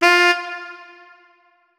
notes, saxophone; Hideout Furniture

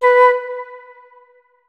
flute notes; Hideout Furniture

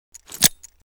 generic checkout, weapons rgd5; Blindside's Weapon Reanimation and Rebalance - Military

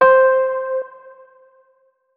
notes, keyboard, electric piano

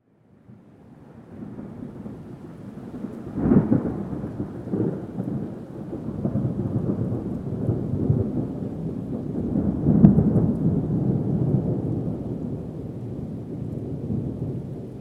thunder far; ambient soundscape; Soundscape Overhaul

